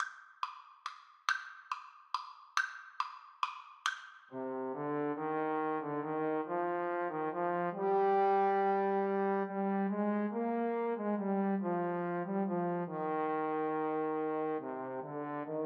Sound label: Trombone Duet